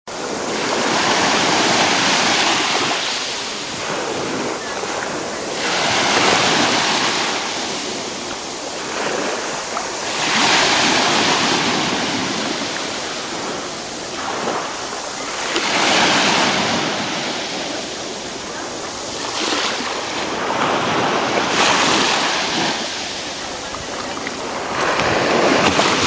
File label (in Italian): melodia di mare